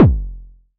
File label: Tempest Kick, Drum Machines; 300 Drum Machines; Dave Smith Instruments